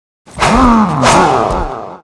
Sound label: WAR ATTACK